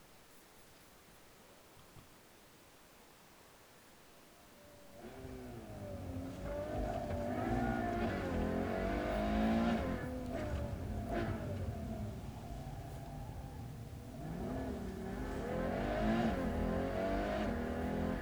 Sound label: Ferrari GTC4Lusso engine